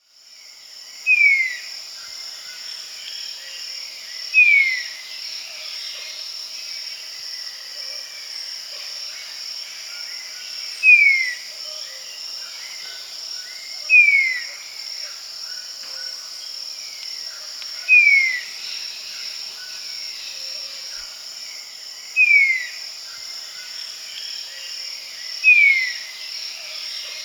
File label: Slaty-Capped Shrike-Vireo (Vrieolanius Leucotis); S. America; Andean Birds; Natures Songs